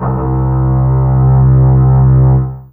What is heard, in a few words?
Cello C3; Fairlight CMI IIe